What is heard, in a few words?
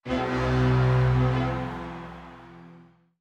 trumpet fanfare; rifts; Stellaris; astral planes